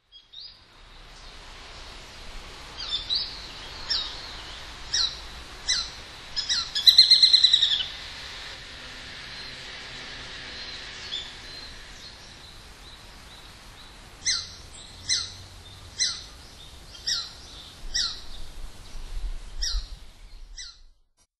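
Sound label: Japanese Sparrowhawk, kokubunji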